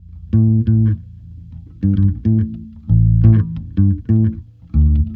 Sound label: Bass, Urban Grooves; Sonic Foundry (Sony Creative Software) - Stylus Pressure Urban Grooves on Digital Wax